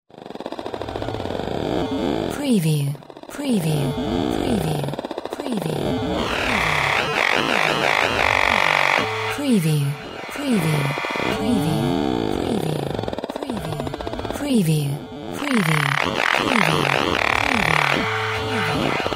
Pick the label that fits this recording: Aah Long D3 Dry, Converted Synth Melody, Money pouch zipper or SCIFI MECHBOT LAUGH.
SCIFI MECHBOT LAUGH